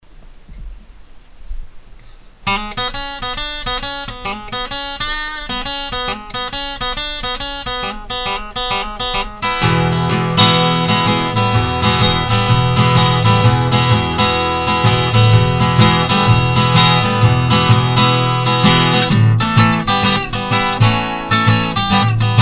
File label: blues